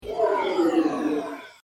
Ufo Prepare To Land; Spacecraft Sound Effects; science fiction sound effect; Andrew Fleming

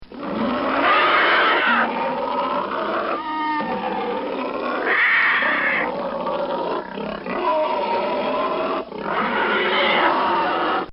Monster Fight; Monsters; Horror

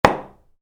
Hammer: Impacts Wood; Hammers; Tools

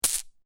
Marble Impact Hard With Several Marbles; A marble falls heavily on top of several other marbles; Miscellaneous Toy Sound Effects; LynneMusic

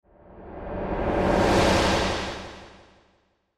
Sci Fi Spaceship; Sci Fi Spaceship Pass By Electronic Whoosh; Spacecrafts

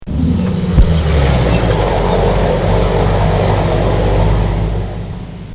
Car Driving Off Fast; Cars; Vehicles; Bobby Cole

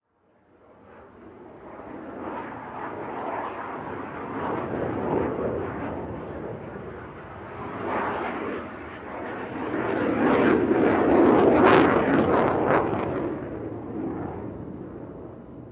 Vehicle Jet Pass By Military; Airplanes; Vehicles

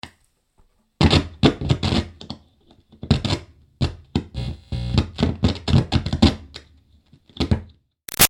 Static Guitar Plug In-Out Dirty; Interference; Buzzes; Guitars; Musical

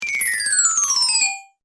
Chimes High To Low, windchime; musical sound effects

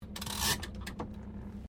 CAR HOLDEN FE 1957 INTERIOR HANDBREAK ON; Classic Australian cars; Vehicles

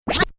Turntable Scratching; DJ (Sound Effects:Musical)

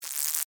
Insect Wings Fly, Steady, Bug; Animal; Short, Buzz

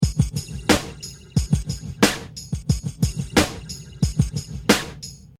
Hip Hop Drum Loop 90 Bpm Without Crowd; Colosso

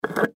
Can Food Pick Up, Can Pick Up Table; Foley

